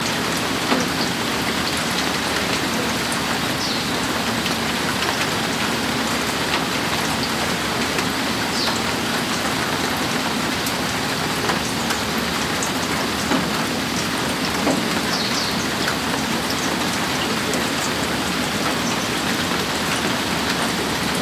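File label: ambience heavy rain drips Kyoto Japan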